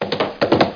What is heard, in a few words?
Horses Maximize; Win95